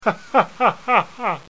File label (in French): Rire